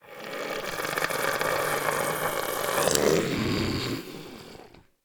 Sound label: idle, zombie; DayZ-Epoch; dayz sfx